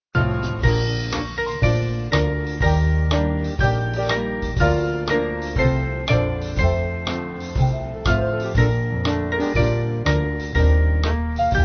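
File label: Band, Music Backing Files for Churches; Stand Up Stand Up; Webb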